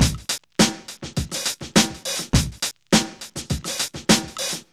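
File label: 103 bpm, Funky loops; Zero-G; Funky Elements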